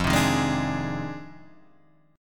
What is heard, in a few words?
F Minor 13th, Guitar, Half Step Down